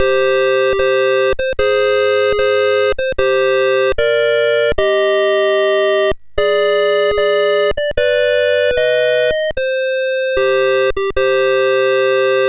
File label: sid, MOS6581R2; Berks Four